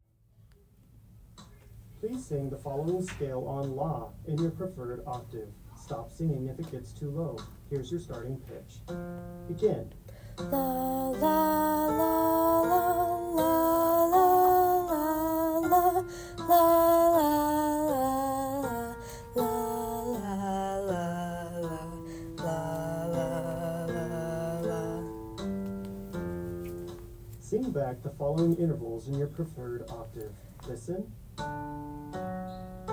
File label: alto; Audition